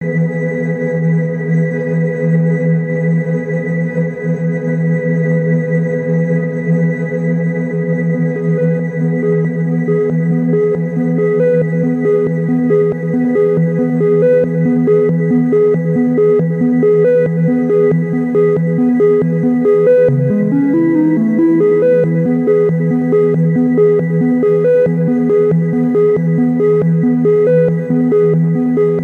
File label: improvisation; friendship 7